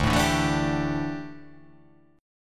D Suspended 2nd Suspended 4th D down, chord; strummed

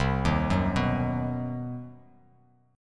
C Minor Major 7th Flat 5th chord, Bass Standard; arpeggiated; JGuitar

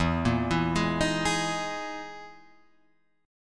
E Major 7th Flat 5th E, Guitar, Drop D; chord shape

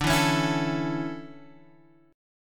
D Major 7th Suspended 2nd Guitar Open G, chord

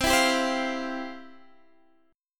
F Major 7th Suspended 2nd, chord

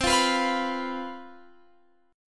C Major 7th Suspended 4th, down, Guitar, Open F; CM7sus4 strummed

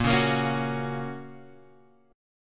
A sharp Minor Sharp 5th, Guitar, Open G

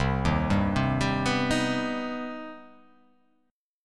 C Minor Major 7th; arpeggiated; JGuitar

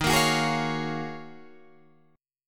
Eb Minor 11th Eb down Guitar Drop D

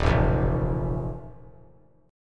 Eb Suspended 2nd Flat 5th Eb 3C 3F 3A 3D; Ebsus2b5 strummed; Ebsus2b5 chord; JGuitar

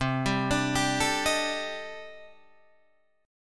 C Minor 6th Add 9th, C, down, Guitar, DADGAD

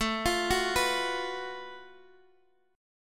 Gb Minor Major 7th Double Flat 5th A, guitar

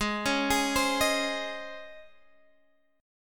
C sharp Major 7th Suspended 2nd G sharp

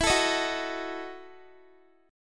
E Major 7th Suspended 2nd Suspended 4th, Guitar; EM7sus2sus4 strummed

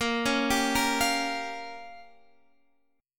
A sharp Minor 6th, down, Guitar, Standard; arpeggiated

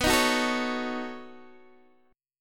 B 9th B down Guitar DADGAD

